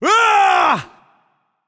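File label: non verbal battlecry, voice; cernunnos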